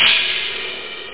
hihat; DRM PERC

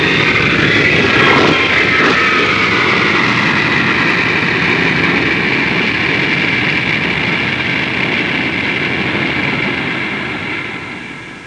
boeing 707 taxiing; Transportation

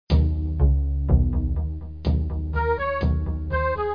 music; Egoboo

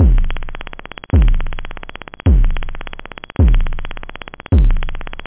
geblang, techno bd; drums

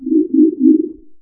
nav buoy; Earth and Beyond; EA Games

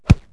thud hit, impacts; Wired for Sound Pro CD; Aristosoft, Inc.

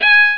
viola

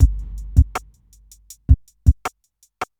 DRUMLOOP AMBNT 160; Ambient

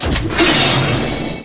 Door Open (Low); Souls in the System

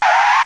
stop; Mobiclic46

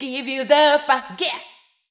VOICE GRL HOUSE 125 A, House Voice

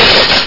GLASS; on the green

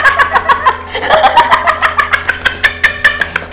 Man Laughing; People

